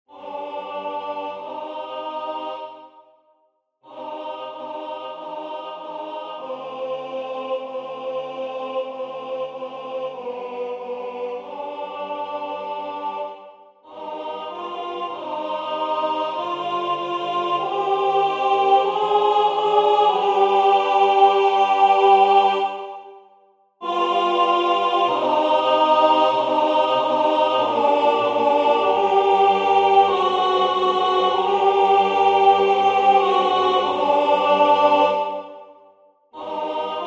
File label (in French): alto; Je ne suis pas digne